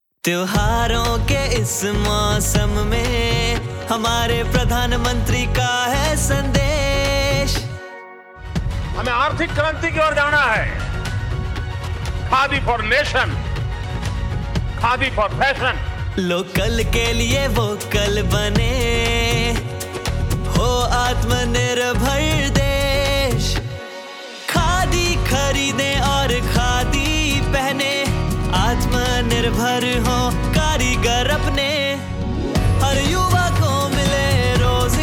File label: Jingle; Khadi Mahotsav